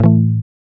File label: GUI A, Acoustic, Roland